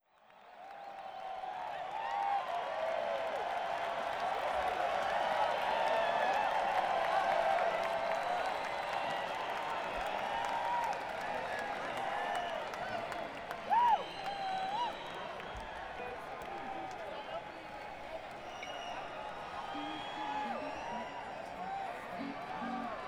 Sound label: Crowd; Commerce City, CO - Dicks Sporting Goods Park